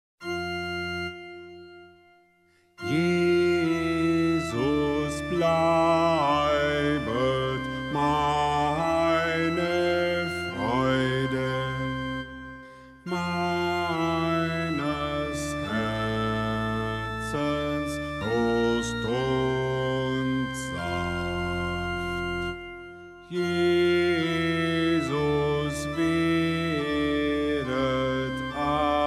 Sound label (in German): Bass; Jesus bleibet